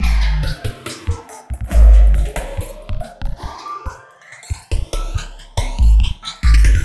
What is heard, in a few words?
808 Loop; Sonic Foundry (Sony Creative Software); Esoterik Beatz